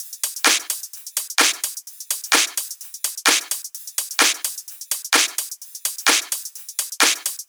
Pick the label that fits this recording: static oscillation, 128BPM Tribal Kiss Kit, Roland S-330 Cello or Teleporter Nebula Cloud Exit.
128BPM Tribal Kiss Kit